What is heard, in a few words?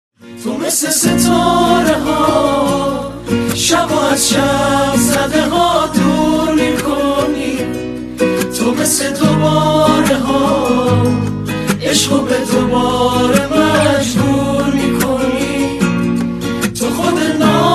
Guitar